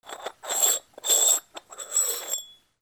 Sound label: KITCHEN